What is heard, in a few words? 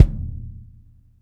TD-20 BD, Roland TD-20, Bassdrums